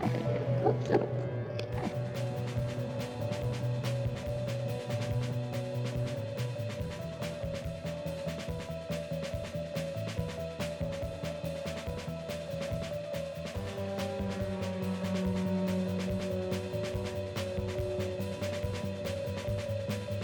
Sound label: Survival B (drums fade in + fade to drums); mcsm music; Netflix